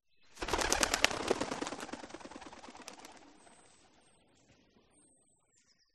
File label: Bird Flying; audio hero